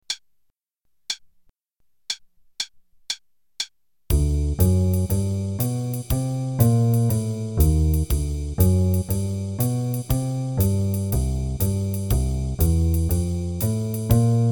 Play a long ii-V-I's over 2 measures, qn=120, Jazz Improv Play-a-Long; Jazz Piano